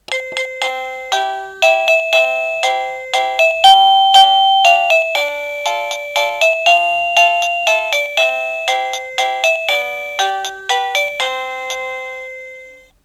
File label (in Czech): melodie